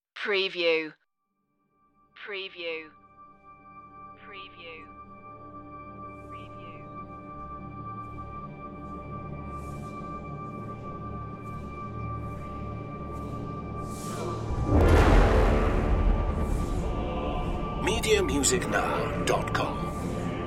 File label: chant intro